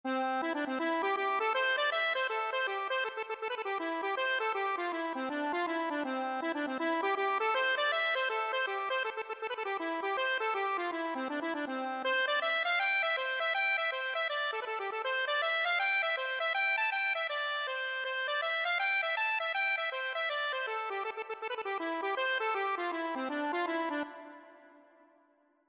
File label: Irish Folk Song for Accordion; Give Us Another